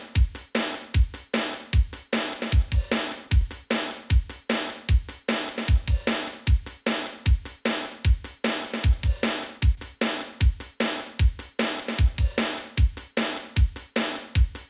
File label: verb beat